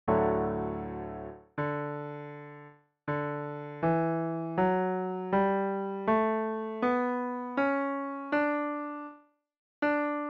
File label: scale